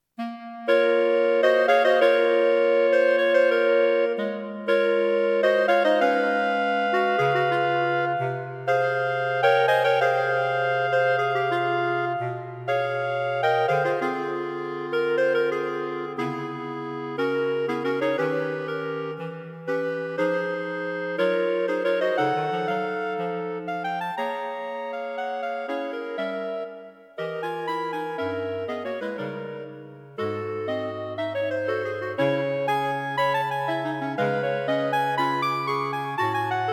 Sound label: Clarinet